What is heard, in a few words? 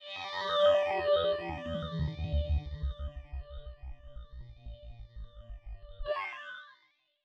120 FX FM ambient D, Nu Jazz House; PowerFX